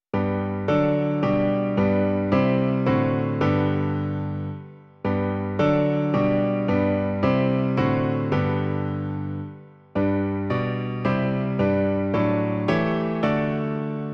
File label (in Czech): klavír, Klavírní verze